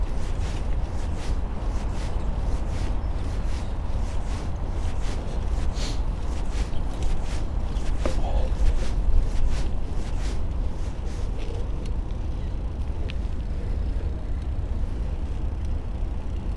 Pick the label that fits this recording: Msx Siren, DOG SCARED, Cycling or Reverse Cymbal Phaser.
Cycling